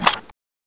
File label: plasma cell out